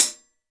open high hat sample A# key, open hi hat one shot tuned to the A# note